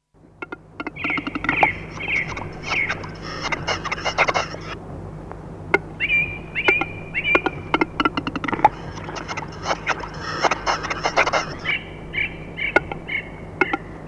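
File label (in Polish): głuszec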